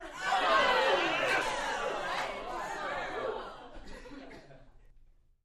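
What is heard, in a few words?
Crowd 15 Interior Rowdy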